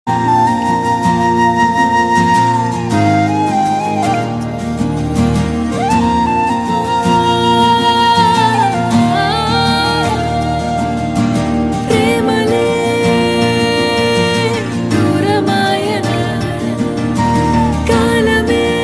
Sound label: With Music Tone